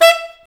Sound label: SAX A. STAB, SAX Alto Section; Roland LCDP07 Super Sax; Alto Sect FX